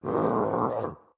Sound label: Leap; Caffiend Caelan